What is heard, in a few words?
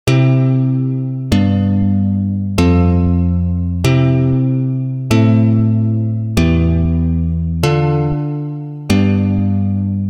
Chord